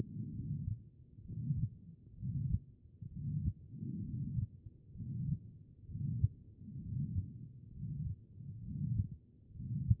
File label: HEART; B MODE